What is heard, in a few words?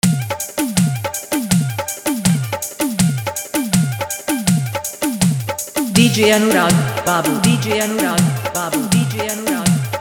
Bhojpuri Old Song Mix Full Hard Dhollki Bass Mix